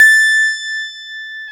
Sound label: FM Rhodes; Club-50 - Foundations Roland